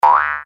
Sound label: Jumping; Mr. Bean - Bean Skidding